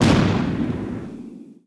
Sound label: Alien Death; Harvest Massive Encounter